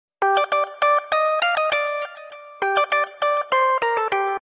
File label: Bollywood SMS Tone